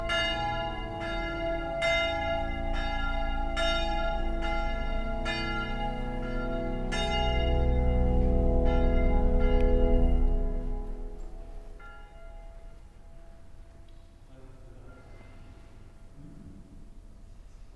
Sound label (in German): kirchenorgel